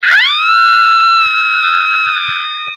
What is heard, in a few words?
little princess scream